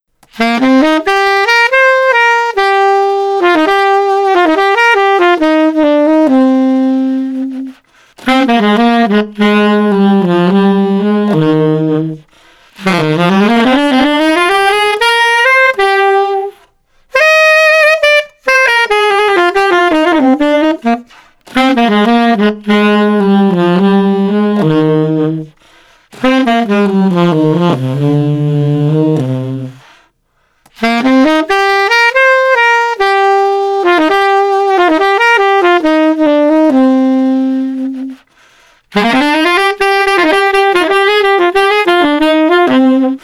Sax Emotional 105

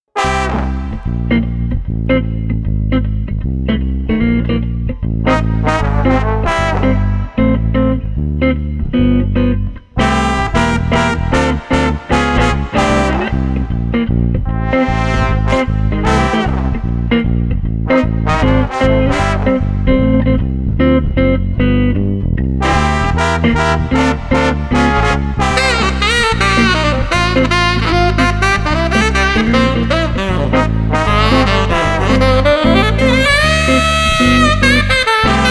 Jazz Combo Groovin no Drums 152

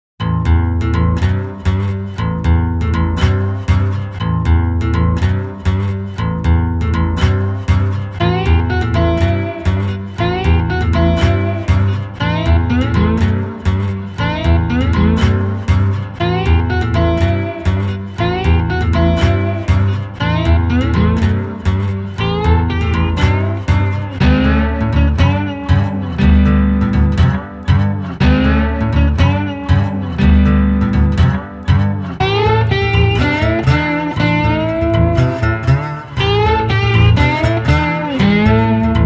Slide Guitar Combo Groovin no Drums 120

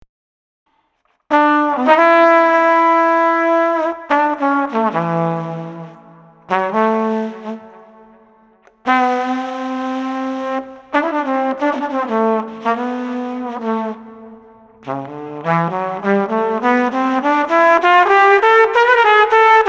Street Trumpet Pensive 100

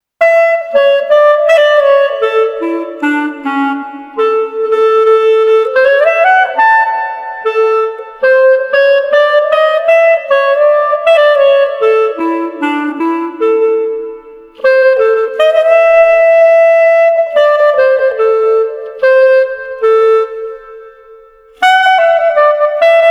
Street Clarinet Light and Airy 75

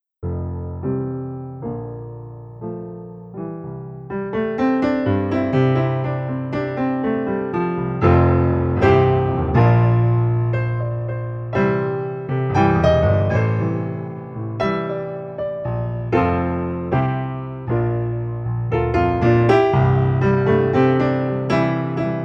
Piano Lounge Melody 105

